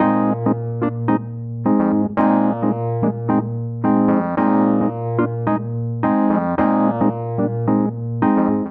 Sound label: Vamp Lively Bb Sus 110 bpm, Electric Keyboards; Ableton; Factory Packs